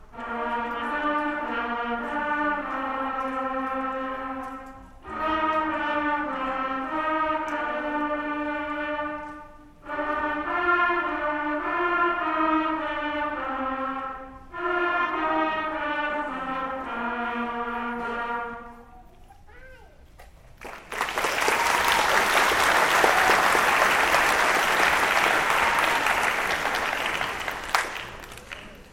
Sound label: Trumpet Feature; Beginner Winter Concert; Knight Band